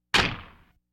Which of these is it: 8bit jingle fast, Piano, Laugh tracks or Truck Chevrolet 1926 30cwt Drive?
Laugh tracks